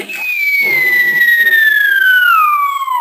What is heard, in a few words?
bomb original